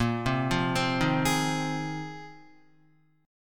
Bb 7th Suspended 2nd Suspended 4th Bb, Guitar 4E 4A 5D 5G 5C 6F; Bb7sus2sus4; arpeggiated; 6,3,3,5,3,3; JGuitar